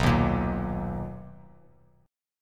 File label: B Major 7th B down Bass Standard; strummed; JGuitar